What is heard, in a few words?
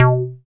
Bass SH-101